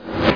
figure Eight Flyer Shoot Snd; F.A.R.M. Patrol

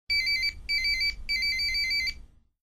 cellphone; WMBonusXP

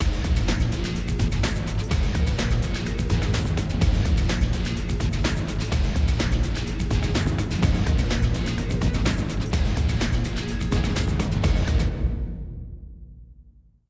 ditty

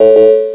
slot lose; megaslot

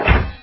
menu win stop; starship trooper

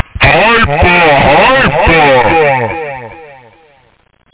vocal

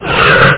launch; Amiga Games 1996 #7; angst

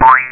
bounce; Golden Games; Shoot'em Up; Charr1.0

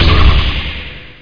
gb fire, golem; Hexen II

